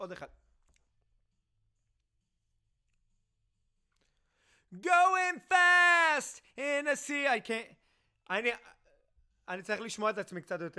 Chorus

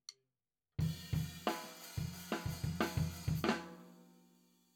Floor

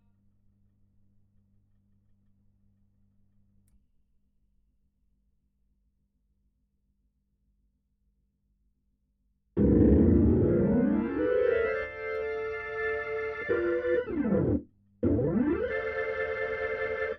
HAMMOND BOT

